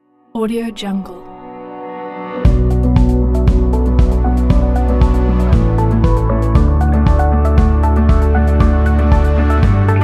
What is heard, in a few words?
motivotional inspiring pop